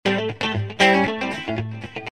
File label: A 117 funky rhythm